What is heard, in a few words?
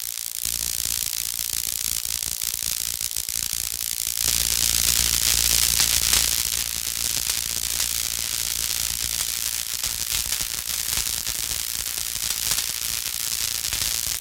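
Electric Arc Variable, arcs sparks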